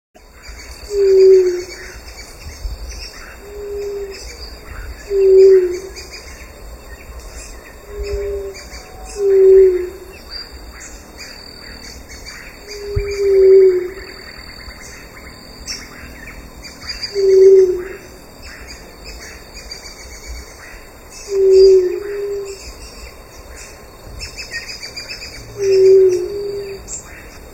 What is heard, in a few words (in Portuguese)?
canto da ave pariri